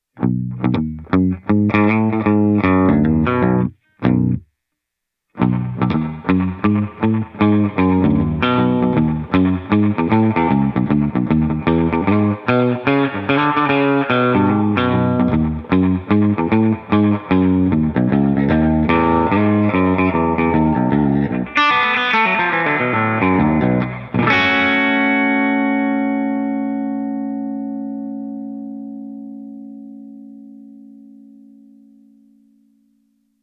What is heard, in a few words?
Spring Overdriven Baritone Plucky Country, reverb